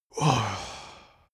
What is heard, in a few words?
male relief sigh